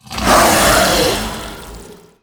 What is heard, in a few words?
Scaled Nightmare Attack